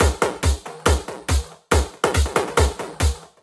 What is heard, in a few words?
Loop 140 BPM; vengeance essential club sounds-3